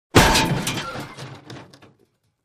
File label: Crash Metal Shatter